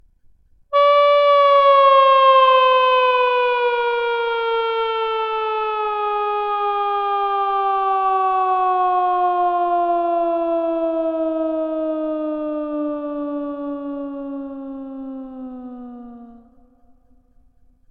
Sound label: falling